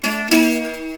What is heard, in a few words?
JAKAY; Spectrasonics; Heart of Asia